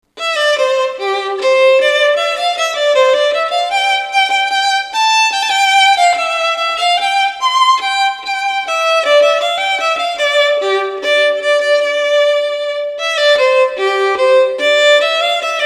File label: violin rondeau; solo